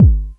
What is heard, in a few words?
Synth